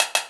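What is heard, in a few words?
snare, EMU Orbit-3 drum machine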